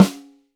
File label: Snare, Roland TD-20